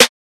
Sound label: Snare Bounce Hi